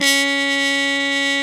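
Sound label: trumpet, harmon dry; Roland L-CDX-03 Disk 2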